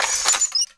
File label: menu, notify; NovaSounds; NovaRealm